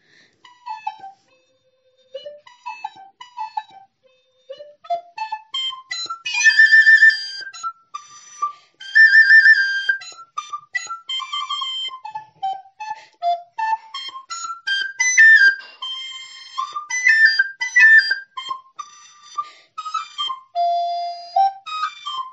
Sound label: Turdus merula